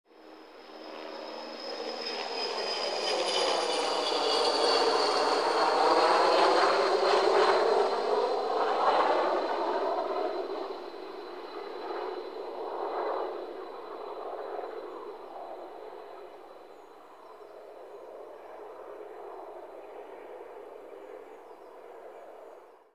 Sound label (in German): helikopter; laerm